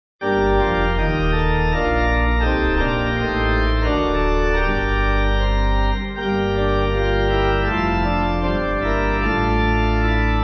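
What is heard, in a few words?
Pipe Organ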